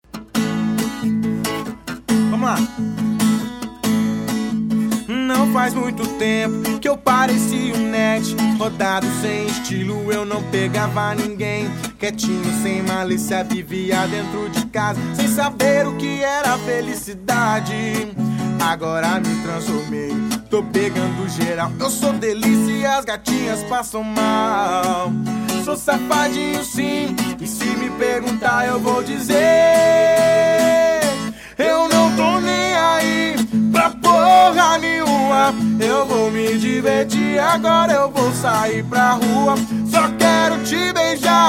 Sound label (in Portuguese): sertanejo